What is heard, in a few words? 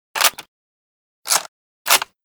kar98k Reload; Modern Weapons Pack